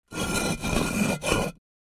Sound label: Cinder Block Rocked, scrapes